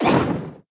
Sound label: enemy lava basic flame; sfx